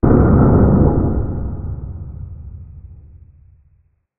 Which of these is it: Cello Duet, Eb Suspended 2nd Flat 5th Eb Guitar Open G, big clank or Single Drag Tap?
big clank